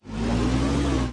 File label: Forklift Car Moving; subway surfers city sound effects